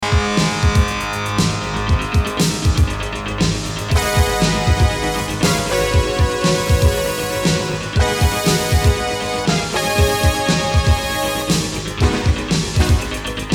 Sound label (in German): OB-Xa Filmmusik